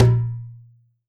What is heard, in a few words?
WORLD DRUMS MIXED DRUMS